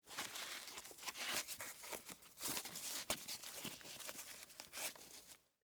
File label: Tennis Shoes Tie, Foley, Tennis, Shoes, Tie, Laces; BlastWave